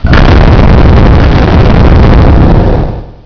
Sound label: boom